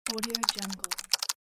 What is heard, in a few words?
keyboard